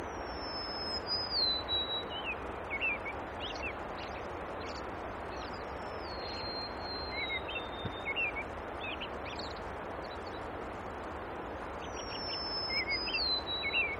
Avian chorus With Golden Crowned Sparrow and American Robin song, Alaska; wilderness